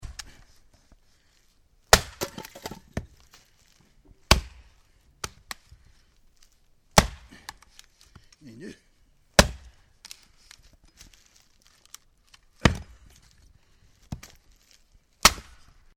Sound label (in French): couper un arbre